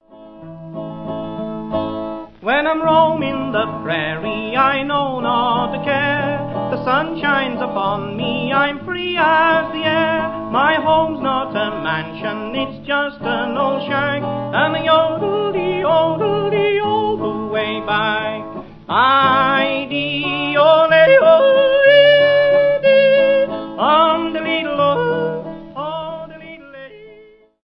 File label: prairie yodel